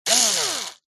industrial electric cordless screw driver or drill very short burst; zapsplat